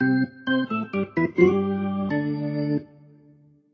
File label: Organ; Fourth of July 2019